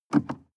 sports car int pedal gas step and release hard; autos; verkehr transport

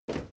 step crowd run quiet single left; schritte

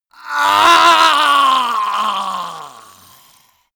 male die gurgling; soundpool

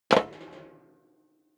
step leather shoes metal rattle single stair up

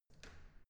entrance door handle up soft

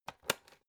electric whisk insert whisk; haus-garten; kueche

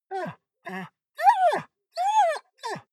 dog whine; natur-tiere; hunde

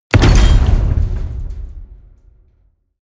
door slam medium

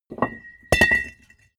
small metal massive drop

